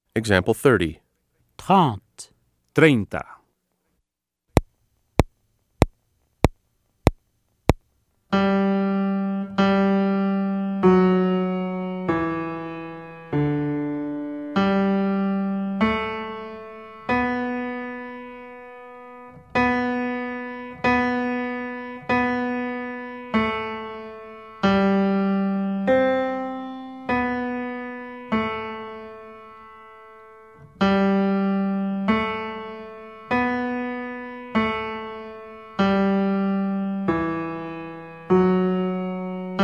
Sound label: Keyboard